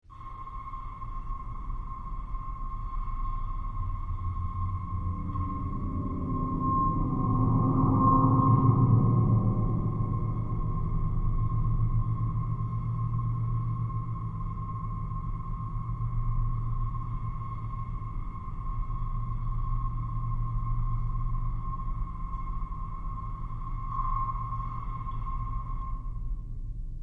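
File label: Piano roll c Woodblock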